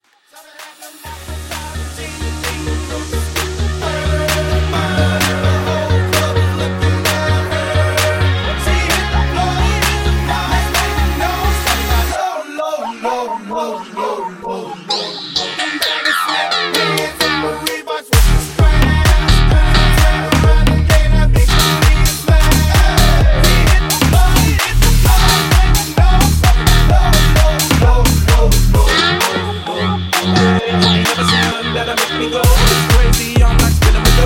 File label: Future House